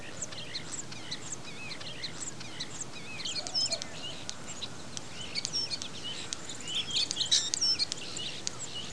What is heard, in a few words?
starling; birds; usgs